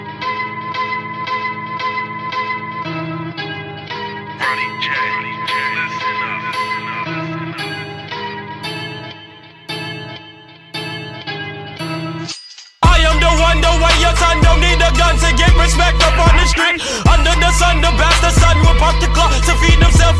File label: English Ringtone